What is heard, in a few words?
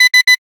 angry beep, nuke; Machines